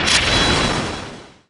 heart piercer creep; weapons; dota; pangolier

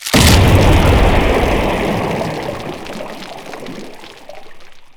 rupture; weapons; dota; bloodseeker

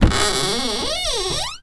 coffin slow; ambient; dota; diretide

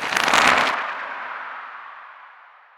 bramble destroy; weapons; dota; dark willow

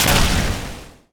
marksmanship target; weapons; dota; drow ranger

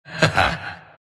laugh; dota; oracle

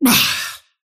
parry fail; dota; kez

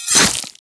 melee; damage; player; physics

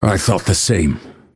move pain; dota; phantom assassin

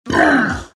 morph pain; terrorblade

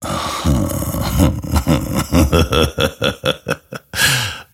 grimstroke laugh; dota

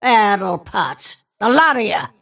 death; snapfire

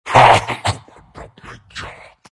big laugh; dota; undying

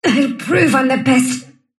sylph move pain; dota; dark willow

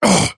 attack effort small; dota; axe

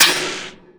poison attack; viper

